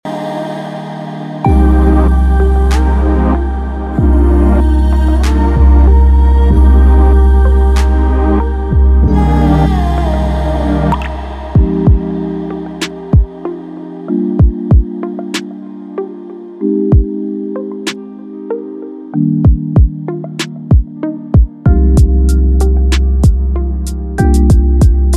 iPhone, RINGTONE; MIUI12

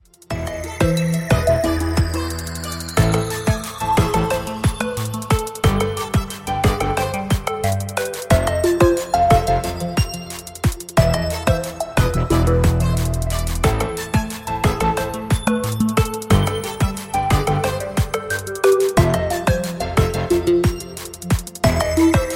Mysterious; MIUI14 and HyperOS 1.0

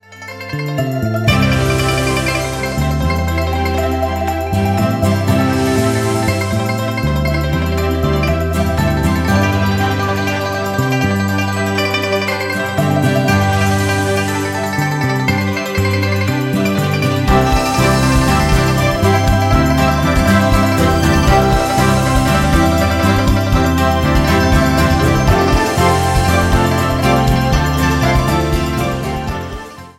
Spring Festival ballad; MIUI12

